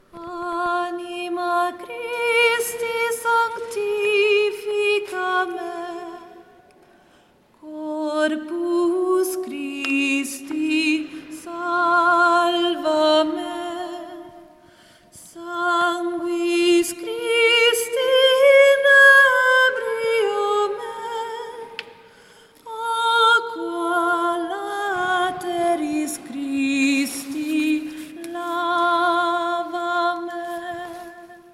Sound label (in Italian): Anima Christi soprani; ARCIDIOCESI DI SANT’ANGELO DEI LOMBARDI-CONZA-NUSCO-BISACCIA